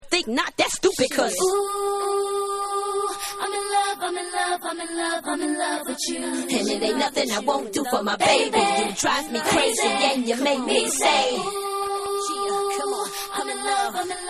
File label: Acapella